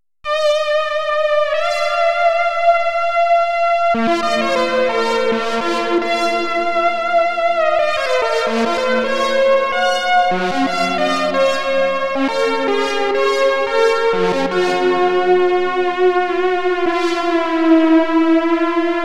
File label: TV-Pro Lead